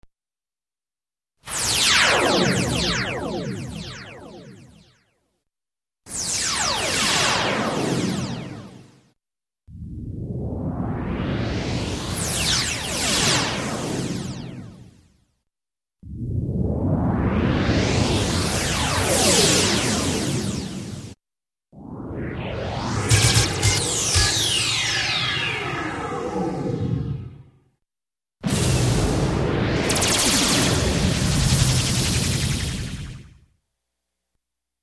vinhetas efeitos sonoros laser

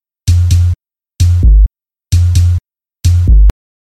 Beat gravezim

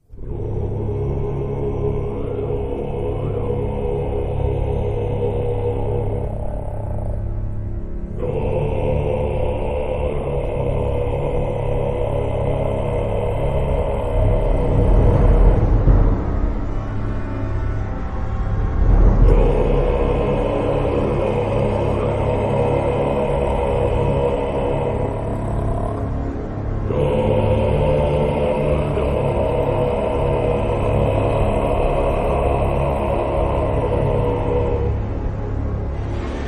musica usada no filme a freira

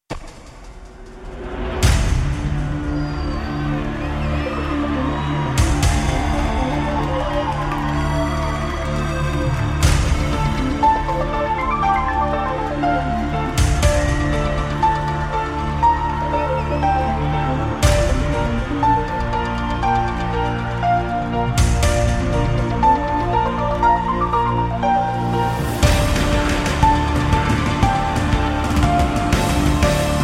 abertura

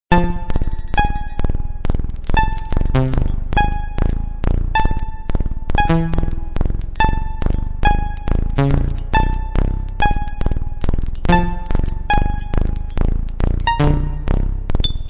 Grind Para Piano Elétrico; Tiago Malta

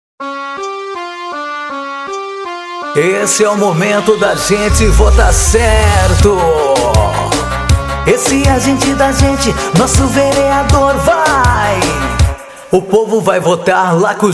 jingle; versao homem para prefeito e vereador

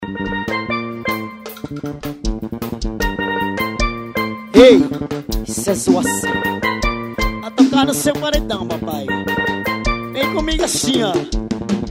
forró

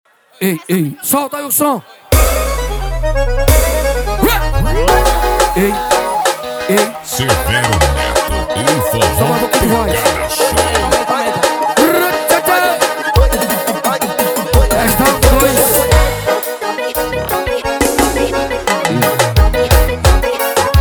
passagem de som

